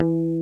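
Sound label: GIBSON HARMONIC, CLEAN GUITAR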